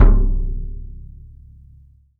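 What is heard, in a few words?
RESONATING KICK; 3D Drums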